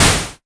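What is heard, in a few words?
snare, ARP Odyssey Drums; Polyend; Simulacrum Sounds; 1 Shots; Synths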